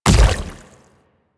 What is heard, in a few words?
Splitter Step Water, Footsteps